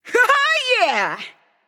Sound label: nv yay; smite; awilix